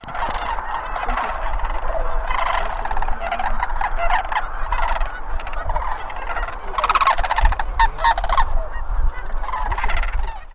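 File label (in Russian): kanadskiy zhuravl golos, sandhill crane voice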